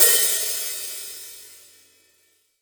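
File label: open hi hat, Real Drum Kit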